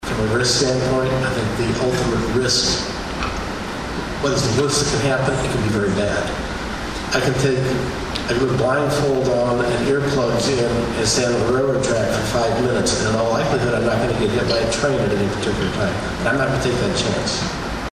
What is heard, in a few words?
Funk